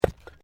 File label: PAVING STONE MOVE, dragging; Material components for constructing more complex sounds; Dragging (Sound Effects:Objects)